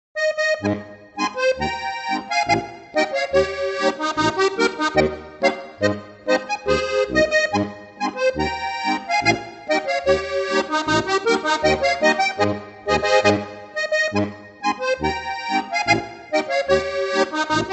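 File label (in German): frühlingsboarischer, Volksmusik; Volksmusik im Jahreskreis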